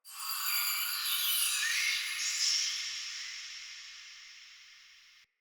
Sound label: Day Texture, Ambience